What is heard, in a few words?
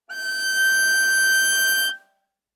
Viola arco ff sul A G6 stereo; MIS Pitches - 2014; Strings